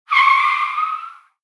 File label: car braking skid